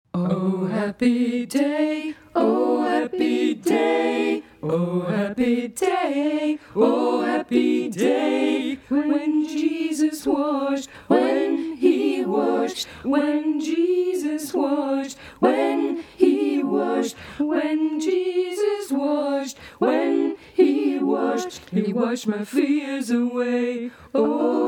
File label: happy day whole, gospel